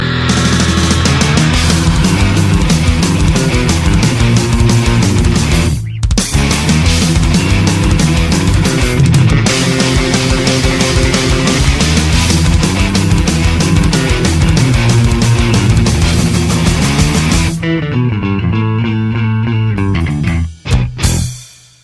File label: metal; pink panther